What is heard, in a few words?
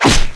claw hit; xenomorphs